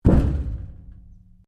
Strike Metal Tank Exterior

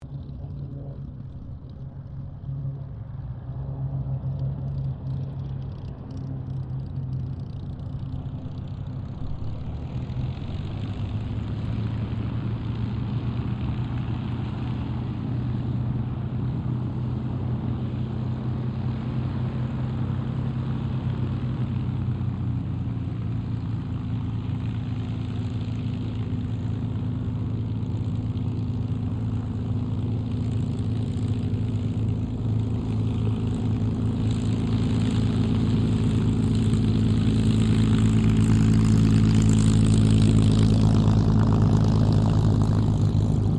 AIRCRAFT SPITFIRE MUSTANG KITTYHAWK TAXI; Mixed aircraft squadrons; Airplanes; Vehicles

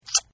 Kiss Sloppy; Kissing; Partners In Rhyme

